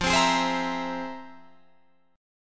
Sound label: F Minor Major 11th, Guitar, Drop D